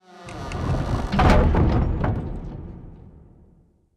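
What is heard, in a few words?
gate close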